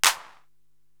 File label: Claps, Drum Machines